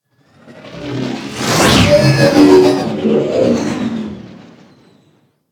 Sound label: Fighter Ship Close Fly By; Space Fighter Crp Ship Engine A